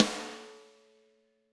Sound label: Snare Crack